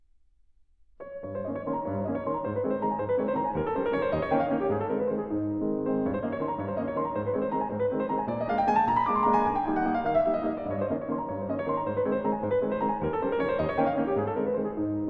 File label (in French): Valse en la bémol majeur, Piano; Chopin; Valse op. posthume; kkivb nº 13